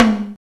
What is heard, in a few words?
TICTALK DRUM